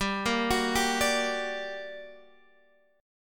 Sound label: G Minor Major 7th G down Guitar Drop D